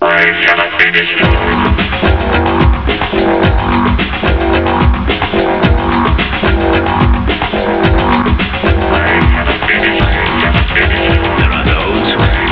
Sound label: techno